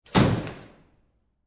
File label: Door Close Gym, Foley, Door, Close, Gym, Annex; Blast Wave